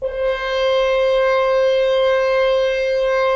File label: Solo F.Horn; Roland - LA Composer vol. 4